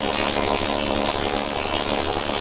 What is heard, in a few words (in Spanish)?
sierra hielo; Grounch